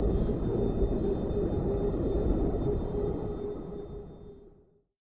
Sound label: flashbang